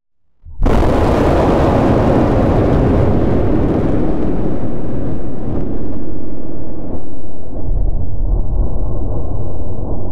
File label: slowed down explosion